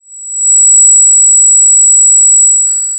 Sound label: Sound of a tea kettle whistling